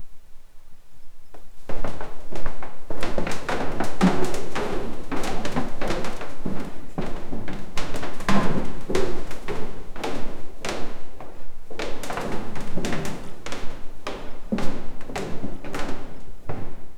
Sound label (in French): pas montant un vieil esca, object